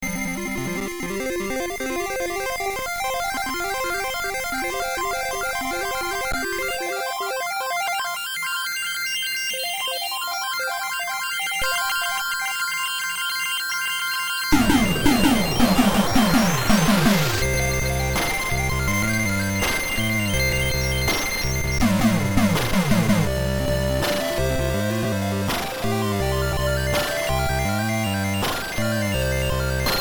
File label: saa1099 music